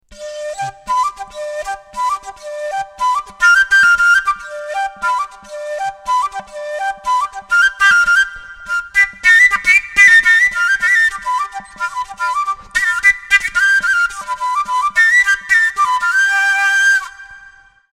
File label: kalyuka